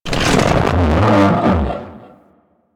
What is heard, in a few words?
Thumper Thump Warning A; Warframe; Grineer Thumper Bot